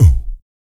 VOC PERC, Vocal Planet Jazz FX BASS PERC

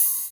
TND OPEN; East West - Phat & Phunky

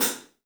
3D Drums, TIGHT ZILDJ; 3D Sound

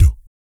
BIG BREATH; ILIO; Vocal Planet VOL-2; Blues & Soul

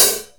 OPEN HH; USB Soundscan vol.51; AKAI

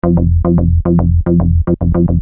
Syn-Bass m F, Techno Synth Loops, 110bpm; Sonic Foundry (Sony Creative Software); Signals I

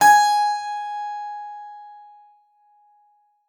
SPIN G5, SPINET; Xsample VOL-7

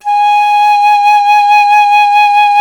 FLT PIC., Solo Instruments; Miroslav Vitous; Solo Instruments VOL-2

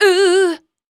VOC F, Dance Vocals, FEMALE

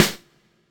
SD, Dance Drums

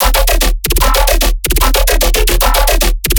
bass loop weird sneeze 150 D#; Virtual Riot

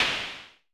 SN Low Punch, Vermona Mono15 Drums Snare

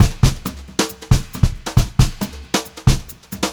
136 VARI, Brushed Drum Loops; USB Soundscan vol.39; AKAI; HOTROD

